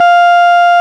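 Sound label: RMI EP piano F 4; Sonic Reality - Vintage Timetraveler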